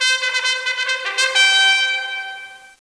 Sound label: fanfare; Caesar III